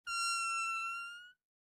fart squeak; persone; rutti e scoregge

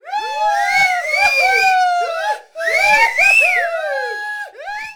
CHEER; fischi

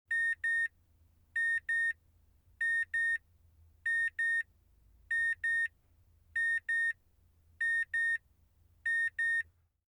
car beeping; trasporti; auto

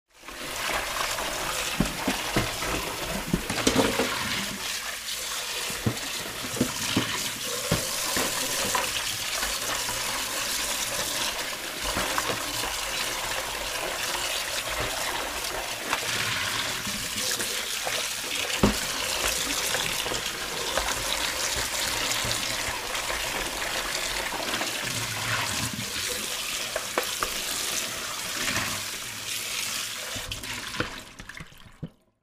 rinsing a bowl

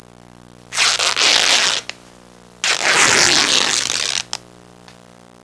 RIPPING

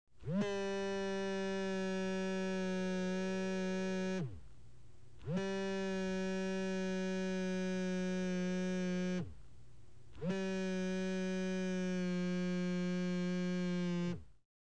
cell phone vibrating

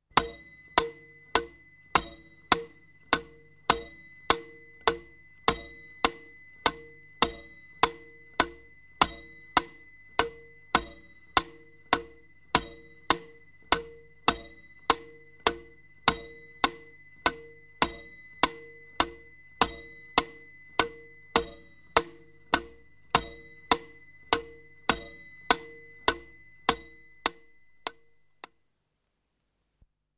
clock; orologi

